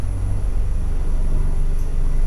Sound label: elevator hum; OneShot Fading Memory